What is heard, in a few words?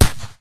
Ambience Gun Shot; 1916 der unbekannte krieg gamerip